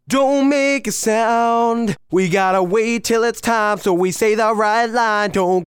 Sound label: slow Verse Distressed